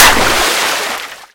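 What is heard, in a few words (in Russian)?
морская волна обрушившаяся на берег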